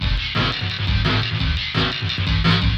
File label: Flange FX, Loops, breaks; M8